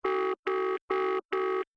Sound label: busy; Dargalon